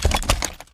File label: rifle deploy; br85